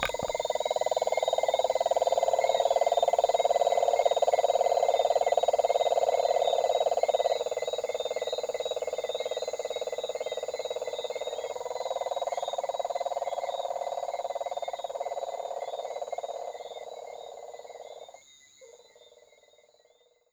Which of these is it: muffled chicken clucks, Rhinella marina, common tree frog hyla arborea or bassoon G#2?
Rhinella marina